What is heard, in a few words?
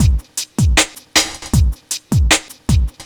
Beat, Futurist Drum & Bass; Drums & Percussion